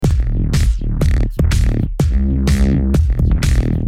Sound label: Loop